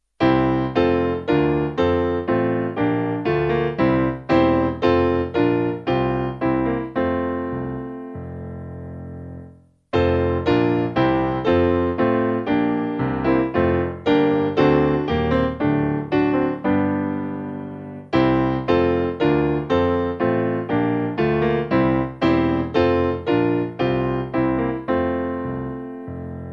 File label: Piano